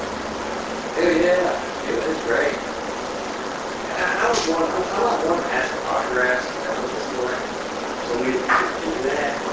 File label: Unexplained Smack Sound Amplified